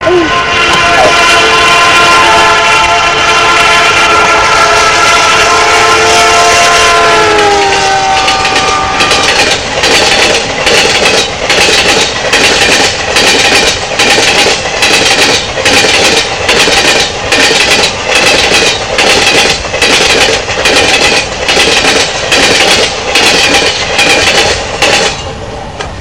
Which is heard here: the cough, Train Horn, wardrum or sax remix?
Train Horn